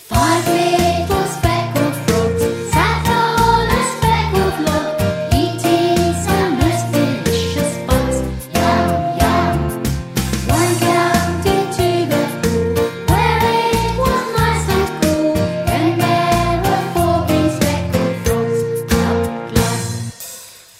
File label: carousel frogs